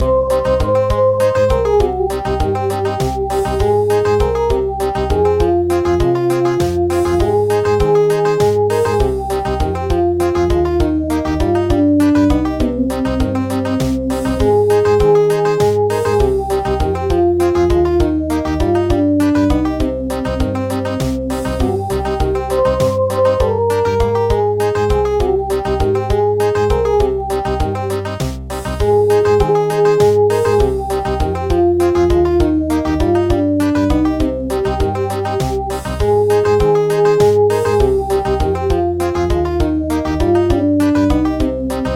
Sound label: Country, midi